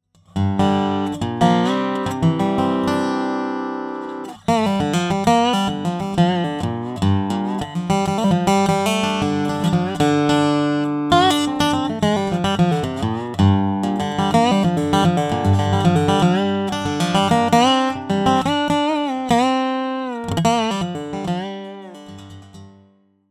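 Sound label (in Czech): schertler, Zvuky snímačů – Custom Resophonic Guitars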